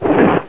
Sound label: slide; Hexen II; golem